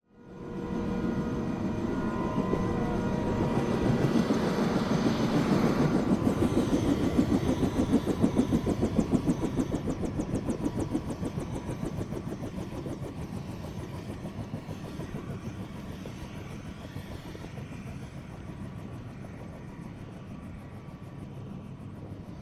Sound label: AMBISONIC SD Helicopter Blades; Vehicles